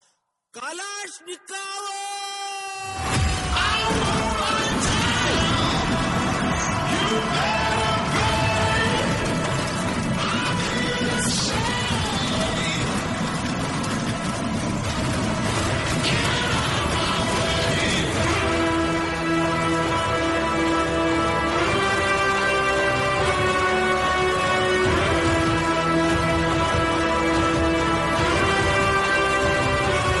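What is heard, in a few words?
background music, Tamil; kgf 2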